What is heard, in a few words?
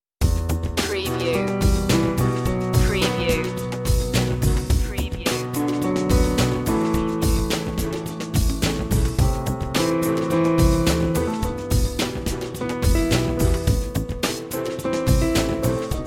chill creole